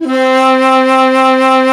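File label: U220 shakuhachi C; Greytsounds; Scott Peers Sound Engineering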